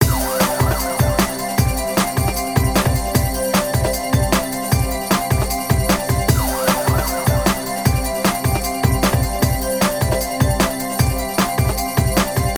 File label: count up; Lucky Joker 20